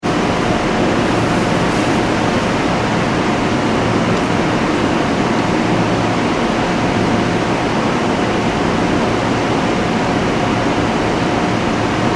Train Station Platform Ambience (Grand Central Nyc); Train Stations; Backgrounds; McKinney Sound